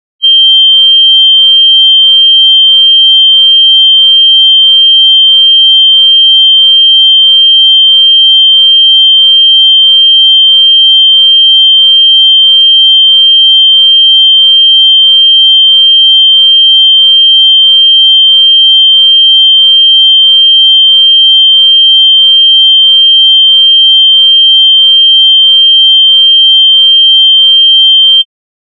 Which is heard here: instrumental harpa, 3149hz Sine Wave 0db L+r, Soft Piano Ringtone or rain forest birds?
3149hz Sine Wave 0db L+r